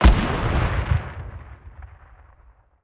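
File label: zenitka shot, anti-aircraft gun shot; ambient; World of Tanks Blitz; neptune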